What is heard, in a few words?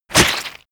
hit blood heavy